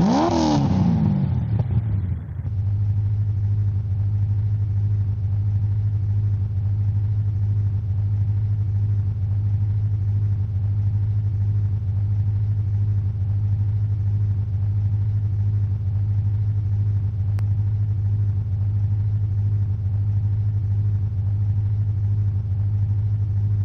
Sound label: start engine; BALLY; voice; CORVETTE